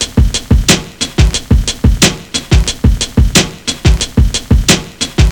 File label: Soft Kit, electric jazz